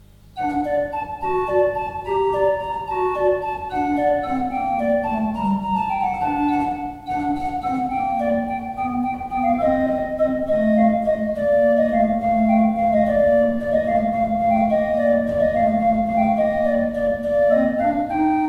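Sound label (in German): Orgel, pfeifenorgeln; Seifert Kevelaer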